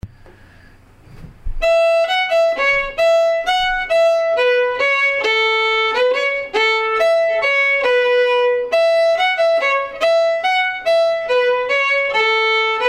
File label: Jessicas Polka slow